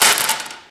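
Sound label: physics clipboard impact small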